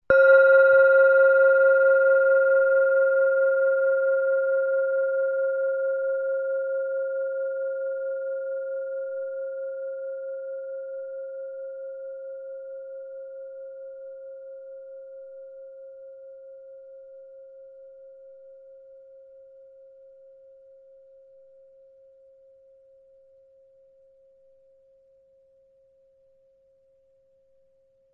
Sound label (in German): klangschale titan